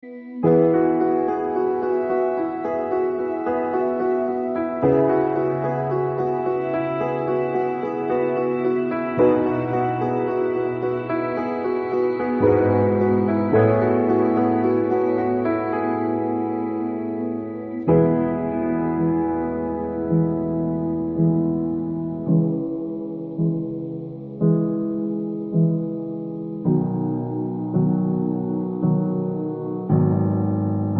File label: english instrumental